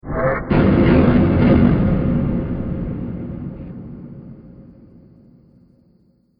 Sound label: Sf Film Brake And Impact, Science Fiction Sound Effects